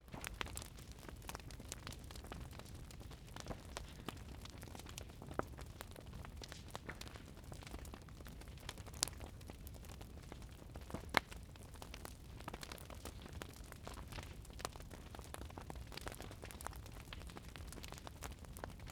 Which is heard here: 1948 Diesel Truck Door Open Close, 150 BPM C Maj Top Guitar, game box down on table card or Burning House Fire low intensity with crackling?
Burning House Fire low intensity with crackling